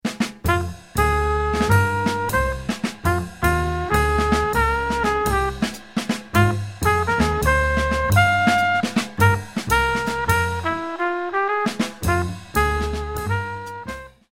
medium minus Piano

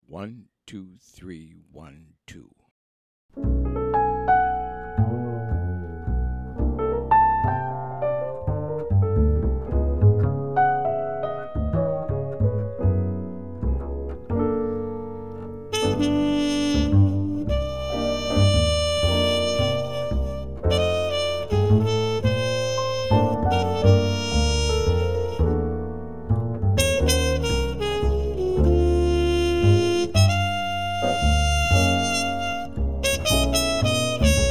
minus Drums

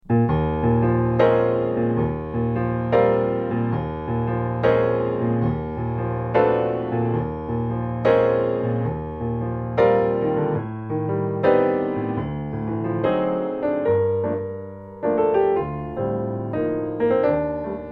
solo piano

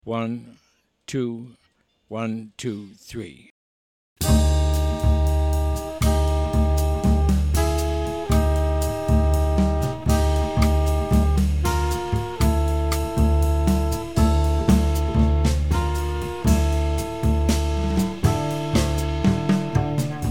bass drums only

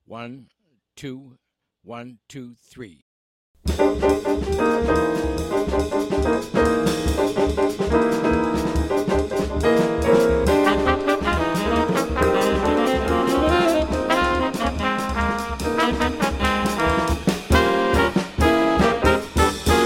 minus bass

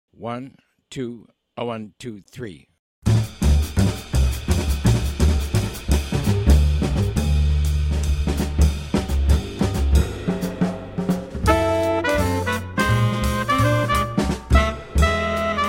Jolly Minor minus piano

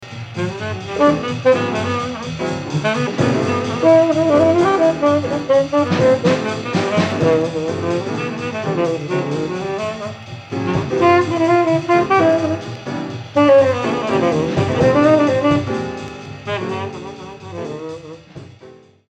solo

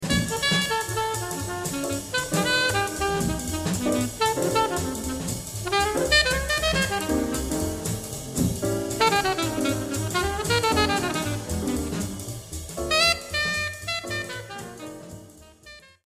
solo

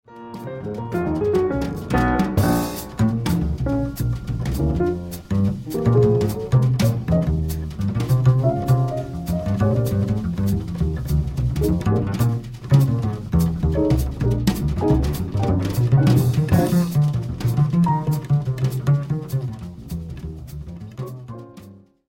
bass solo

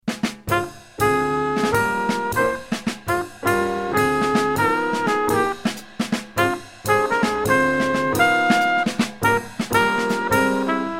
medium minus Bass

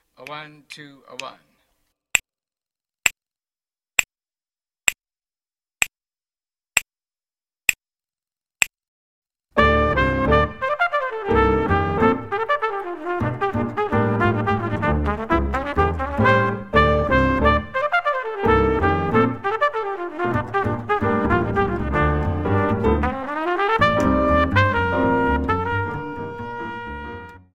4-4 fast minus Drums

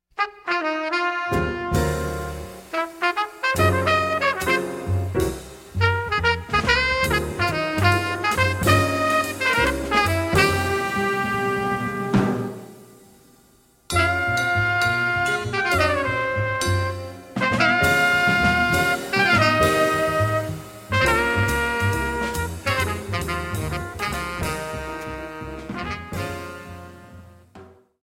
Night watch trompeta toccata

